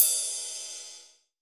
RIDE, Ride Cymbal

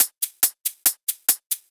Loops 140 BPM Hihat Percussion Loops

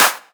Claps; Vengeance - Essential Clubsounds Vol.5

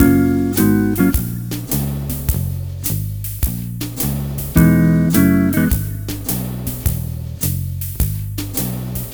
Multi Guitar Swing Mix-F#, 105; Zero-G Kocktail Kollection; Construction Kitz (Lounge)

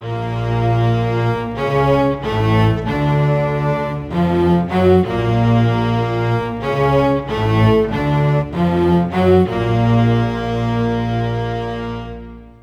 95 Bb min non legato, Low Strings; PowerFX - Downtown Orchestra

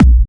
Trance Kicks

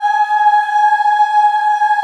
G#4 CHI OH-R, Choir; USB Soundscan vol.28; Acoustic & Synth; AKAI

